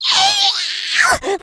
rage, infected, female

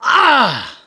hurt major, mechanic; voice

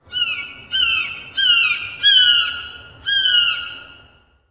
forest bird, ambient

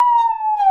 saw danger, music, zombat

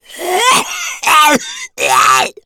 smoker lurk; voice, idle

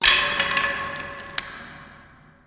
debris random, ambient

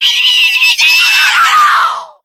ignite, infected male; Left 4 Dead 2; npc

